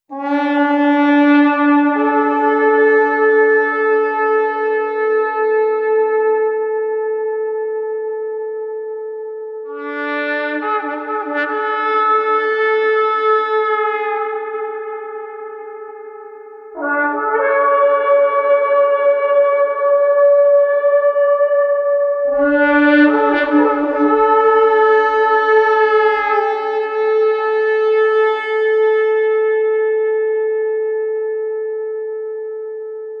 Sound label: Ram's Horn Call